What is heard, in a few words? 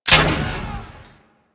Concussion Rifle Empty Fire; Grineer